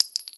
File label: bullet out, Deagle